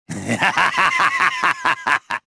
victory; reborn

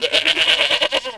goat; animal; Tony Hawk's Project 8; XBOX

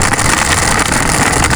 grind wood, terrains; Tony Hawk's Project 8; XBOX

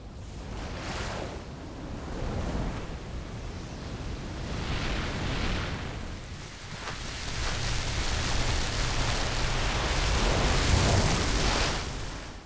ocean, tracks

